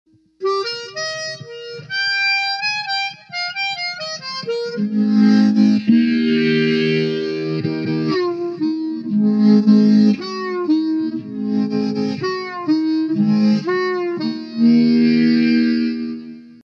Cln Dly, Spacey; DigitechRP

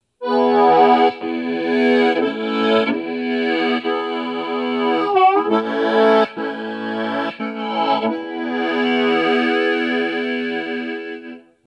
TC Chorus; Fireball; Digitweed; DLXR 1x12

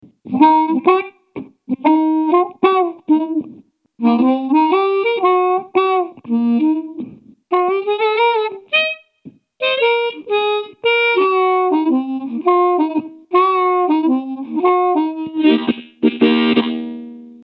Medium Hard Blues; DigitechRP; RP200

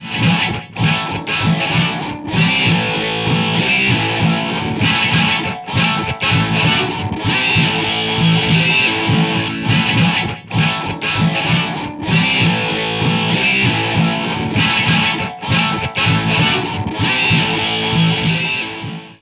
Minor slide

